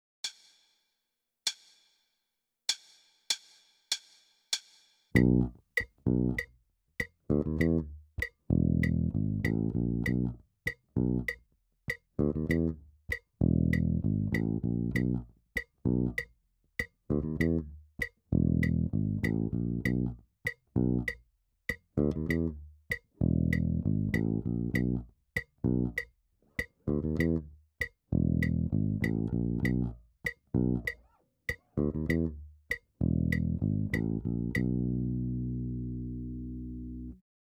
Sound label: Rock